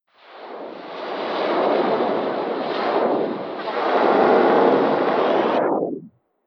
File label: Winds Of The Vortex; Wind Sound Effects; Fantasy Sound Effect